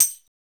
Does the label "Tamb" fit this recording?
Yes